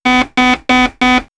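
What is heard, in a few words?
Alarm, electronic fx; X-Static Goldmine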